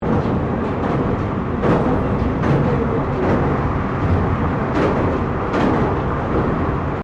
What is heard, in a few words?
Train Aomori Series E731 Express Interior Bridge Crossing Loop, Japanese Trains; Train Sound Effects; Vehicles